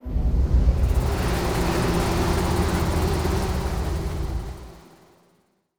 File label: black clouds; Stellaris; extreme frontiers